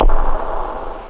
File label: drums bass; da capo